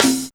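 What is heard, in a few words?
SN, snare; X-Static Goldmine 1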